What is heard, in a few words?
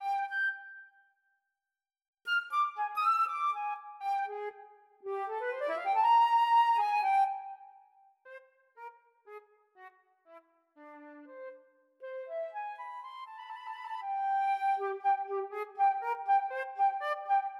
Flute